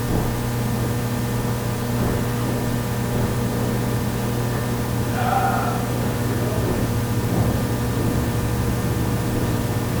Unexplained Breath 1st floor by elevator

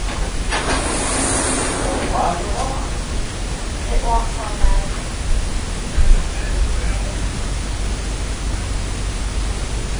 Basement Unexplained low whisper Amplified; Truner Home; (There's a man in the well)

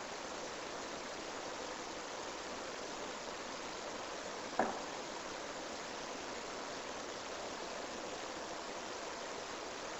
Unexplained 2nd (Knock) 2nd Floor Mid section

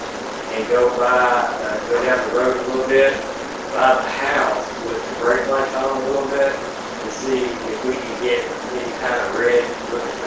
Unexplained Whisper Amplified; (House)

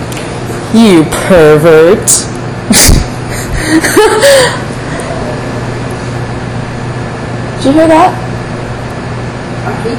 Unexplained Faint Moan AMPLIFIED

